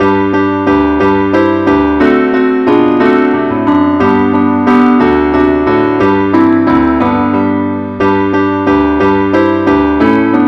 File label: keyboard only key g; angelvoices